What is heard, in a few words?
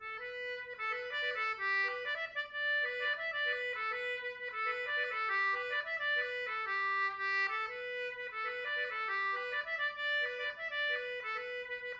Celtic Button Box, slides; Old Favourite